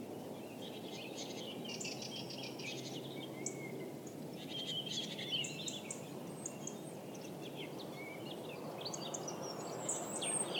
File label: Forest Binaural